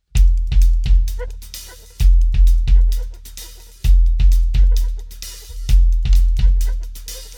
fat box kick no multi comp output stereo out, multiband compression adds high pitched ring